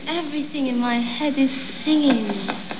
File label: singing